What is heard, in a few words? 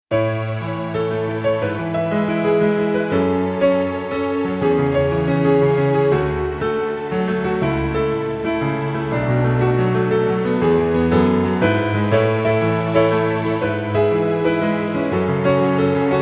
Piano Strings